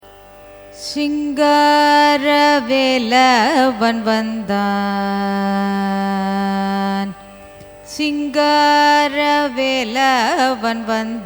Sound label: raga ananda bhairavi carnatic